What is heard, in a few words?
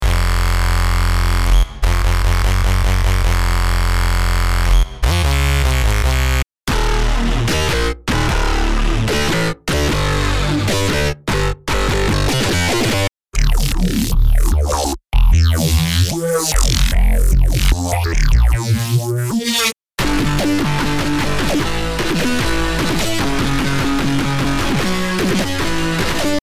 BD Dubstep